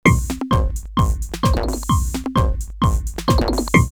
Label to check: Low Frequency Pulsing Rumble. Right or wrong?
Wrong